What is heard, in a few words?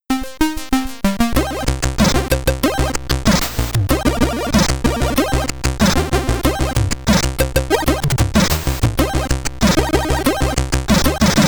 Boss Theme (SID Stereo)